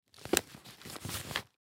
Camera Case Put Camera In, Digital Olympus Camedia C720; Cameras; Blastwave